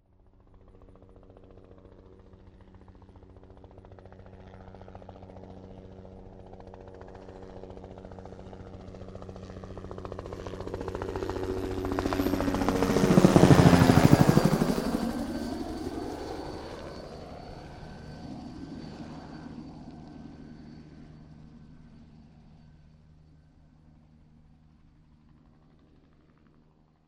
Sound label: HELICOPTER